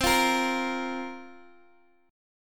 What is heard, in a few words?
C 7th Suspended 4th, Guitar, Open D